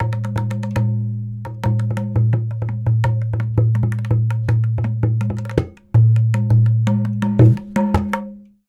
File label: Musical element tabla beat drum; BLASTWAVEFX